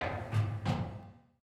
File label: Stairs Metal; Treasure of Nadia